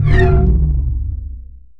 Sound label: gravity off